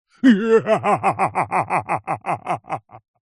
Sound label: male middle aged laugh slightly manic; human